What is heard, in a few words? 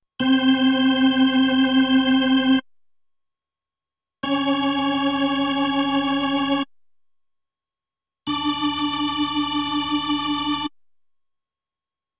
B3 Organ; Masterbits CLIMAX 8